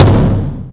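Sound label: bass drum, right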